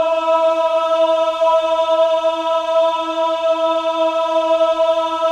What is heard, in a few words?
men "ah", choir of ahs; Roland - LA Composer vol. 1